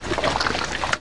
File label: alligator